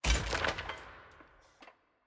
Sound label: sfx ui collection artifact click; caitlyn